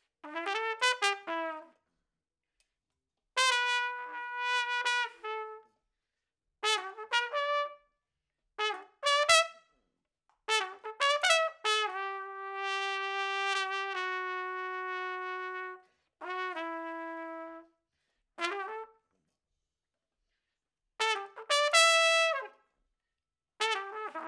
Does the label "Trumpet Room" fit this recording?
Yes